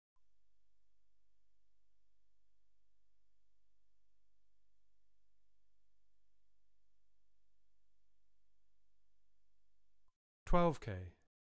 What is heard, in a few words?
Sine and square wave spot frequencies, 10 kHz